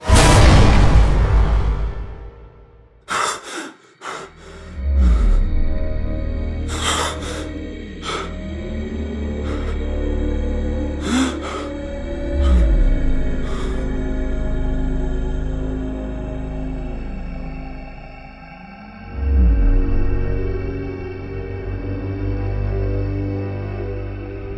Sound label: Stinger; Syndrome